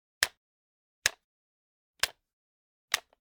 Generic Button Plastic Tiny; boom library; The Interface

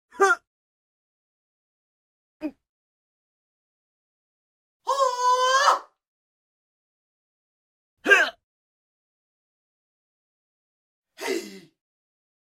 Vocals (Male Grunts, Yells); Hollywood Edge; Martial Arts and Human Impacts

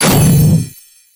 Creature Melee Magical; Kilta

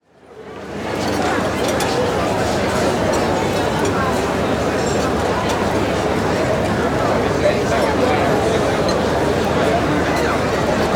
Restaurant Large Outdoor Cafe, Walla; Sound Ideas; Walla Series

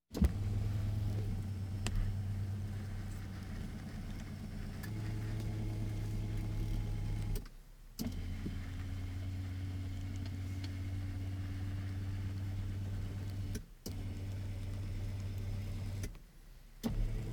Automobiles various

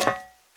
fire escape; blindside

